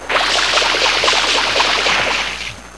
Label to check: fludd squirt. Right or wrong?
Right